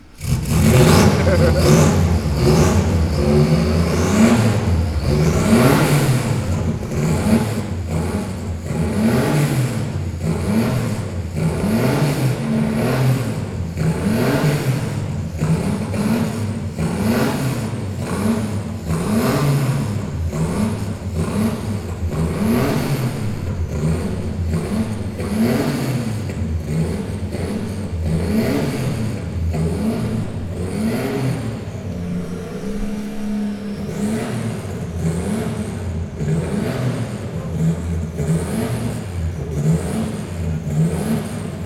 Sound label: Porsche 959 Paris-Dakkar; Porsche Soundnacht 2018